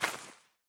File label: grass; Lethal Company